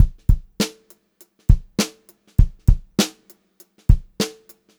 VAR, Funk-Groove Drumloops

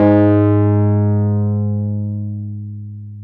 PNO, Wurly

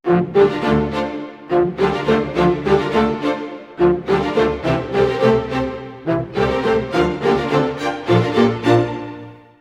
105 d min full mix, Orchestral arrangements

